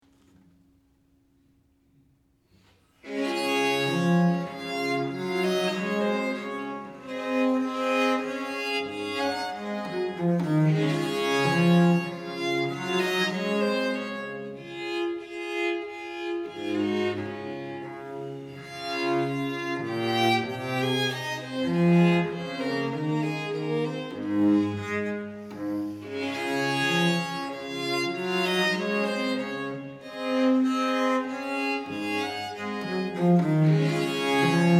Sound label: Menuetto